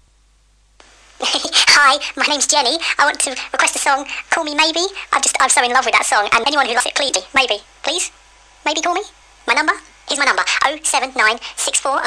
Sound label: song intro